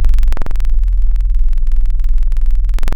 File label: Reese Wave; Synthetic's Serum Collection; Surge Sounds - ASTRO - Serum Wavetables